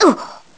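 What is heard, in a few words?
Wobble Ice, gameplay sounds; Link wobbling (on ice); zelda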